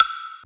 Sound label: Perc, Percussion; FLPRO